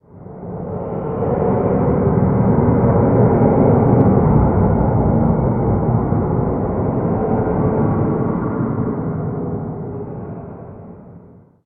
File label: rnd dark, ambient outdoor